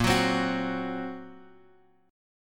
A sharp Suspended 2nd Sharp 5th, down, Guitar, Open F; strummed; JGuitar